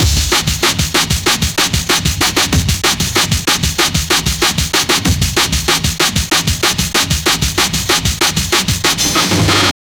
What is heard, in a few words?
breakbeat sample